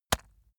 leather impact mud hard

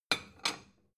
plate small shelve soft

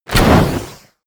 bow shot fire

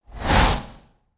swoosh

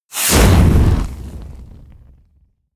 burst

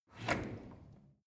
drawer cutlery open draw short

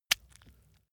leather impact mud medium

